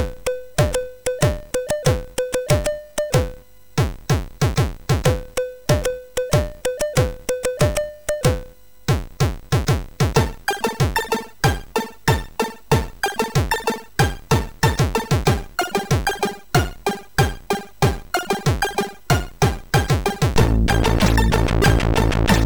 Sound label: Ping Crap, MOS6581R4